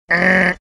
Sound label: making buzzer sound